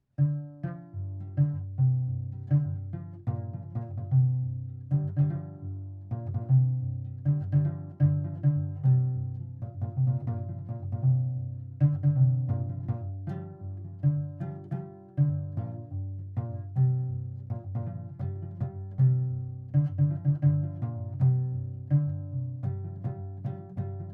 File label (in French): gros ngoni 6 cordes